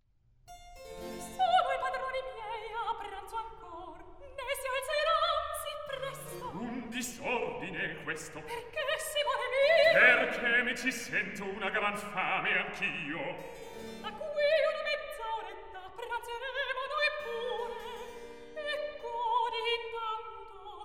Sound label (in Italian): Recitativo; Sono i padrone miei a pranzo ancor; Mozart; La Finta Semplice